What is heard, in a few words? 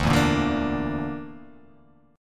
D Suspended 2nd Sharp 5th D